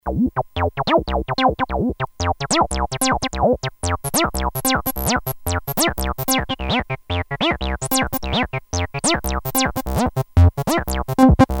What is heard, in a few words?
square